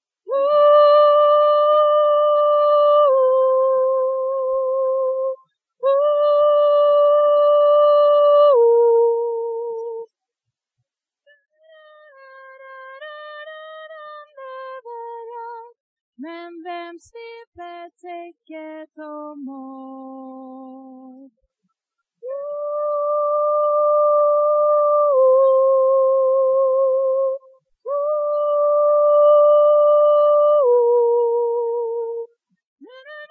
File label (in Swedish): valkommen hem tenor, stämmor